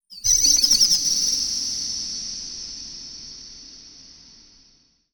TECHNO FX 140

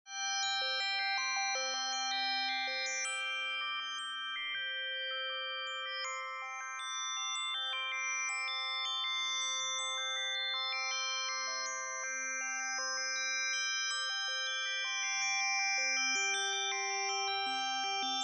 Abstract dreams

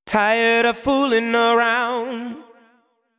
deep house male, 125, A, vocals

